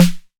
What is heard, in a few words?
808 SD Tape Sat, Snare Drum; Tape808; Goldbaby Tape808 & Tape909